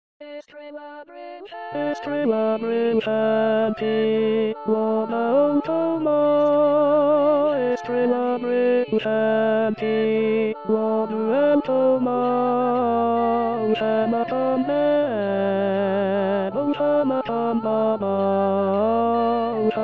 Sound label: tenor